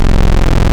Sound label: Modular 3 VCO PWM, wavetables; Animoog